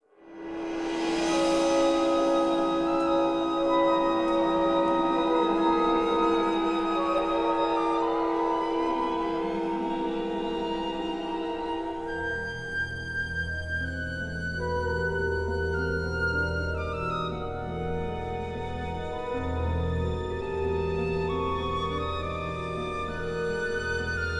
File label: suspense, music